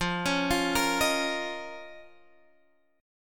F 7th Suspended 4th Sharp 5th Guitar Standard, chord; arpeggiated; capo 2; 13,16,15,15,16,x